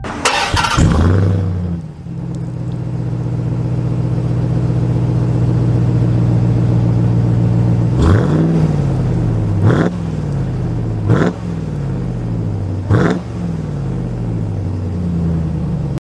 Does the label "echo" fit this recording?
No